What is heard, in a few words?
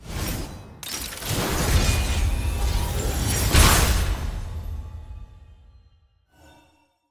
sfx tier crystals promotion to grandmaster; challenges